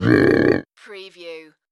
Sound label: creature vocal